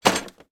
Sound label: industrial hand cart bounce down single concrete step with boxes on; sound effects one